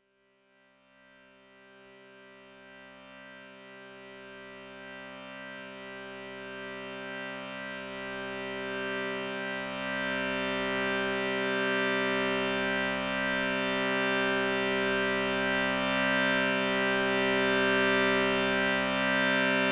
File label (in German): klang einer shrutibox; Klangbeispiel einer Shruti-Box